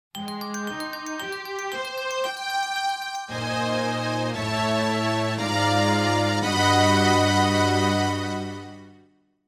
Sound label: Fanfare; Four Swords Adventures